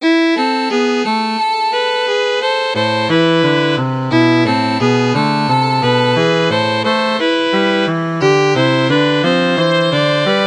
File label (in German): es sass ein schneeweiss voegelein streichtrio